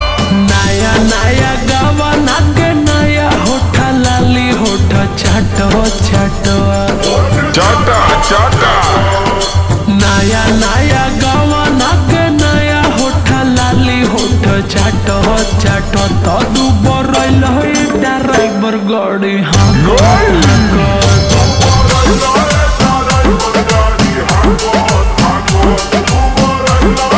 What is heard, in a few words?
slowed and reverb